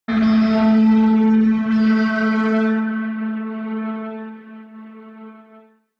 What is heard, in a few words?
synth chirp, drones